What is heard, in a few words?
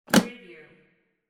briefcase lock